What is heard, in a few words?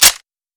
rifle slide forward